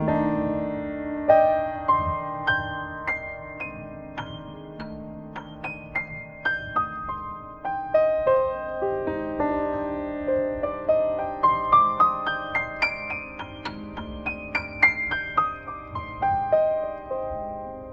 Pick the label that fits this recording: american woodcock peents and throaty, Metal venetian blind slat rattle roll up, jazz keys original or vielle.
jazz keys original